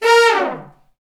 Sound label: BRS, Section FX, Fat Falls; Roland L-CDX-03